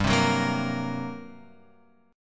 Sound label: G Minor Major 7th Double Flat 5th G; GmM7bb5; {3 3 4 3 X 6} strummed